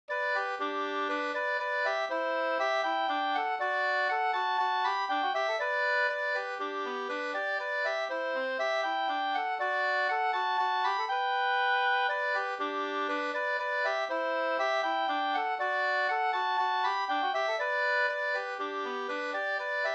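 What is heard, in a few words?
polska, two-part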